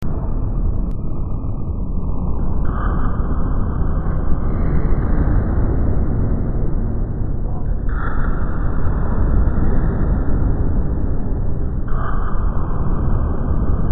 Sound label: alien hive; ns lost